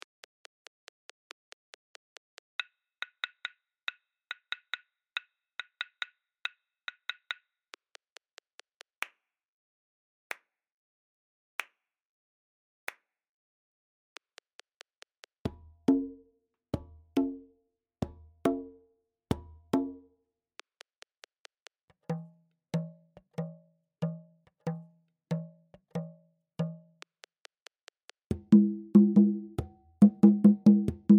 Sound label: Baakisimba 140bpm; Afrika